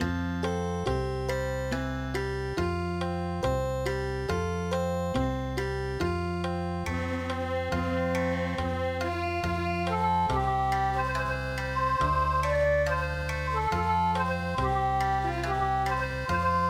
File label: Okinawa